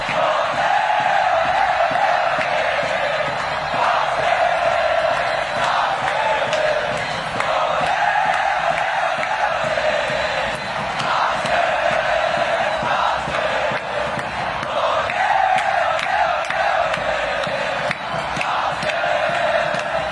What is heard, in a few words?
team chant ole ole; kerala blasters